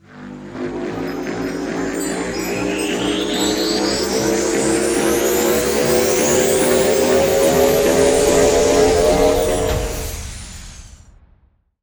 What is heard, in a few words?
fs butter Attention; netent